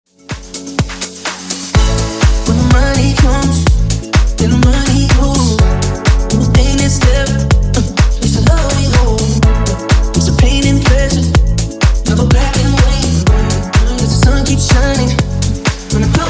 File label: Funk House Remix 125 bpm